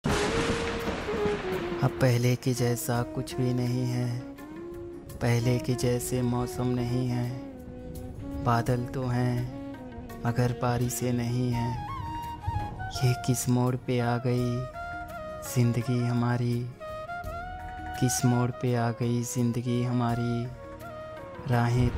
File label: Sad Ringtone